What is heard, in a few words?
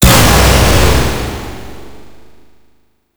Drone Explode; Super Drone Osprey Min